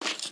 脚步声; 流浪者

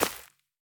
杜鹃破坏声

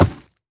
猪灵蛮兵脚步声

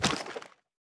脚步声; 炽足兽

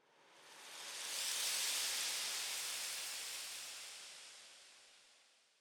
灵魂沙峡谷沙声; ambient

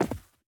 犰狳展开结束声

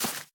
洞穴藤蔓破坏声; ambient

